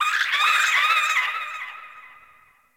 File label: Venus Distant Creature Alien Night; Warframe; Venus Open World; Venus Landscape; Ambience